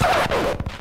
Vinyl FX and Scratches, FX Scratch; Vengeance Samples

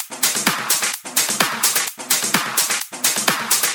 House Loop, 128 BPM; Vengeance Samples

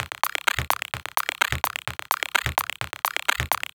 Minimal Loops

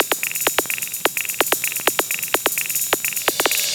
House loops 128BPM; Vengeance Samples

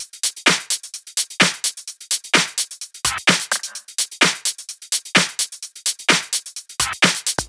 128BPM Drums; Vengeance Samples; Beatdancer Kit

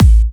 Bassdrum; Vengeance Samples

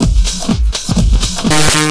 drm 125, drumloops distortmix; house; PS-28 Vocal House Samples

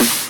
snares; Vengeance Samples

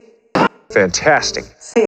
House Loops, Vocal Loops House 128BPM

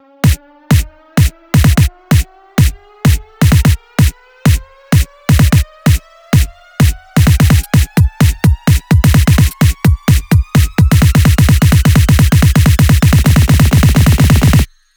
Big Fill Ins 128BPM; Vengeance Samples

